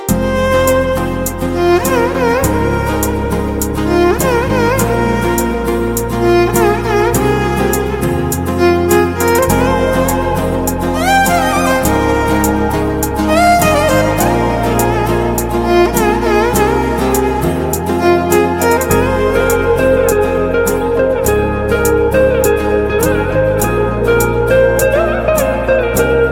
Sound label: BG Tone; Telugu Ringtones; 28 Sec; from Srirastu Subhamastu Movie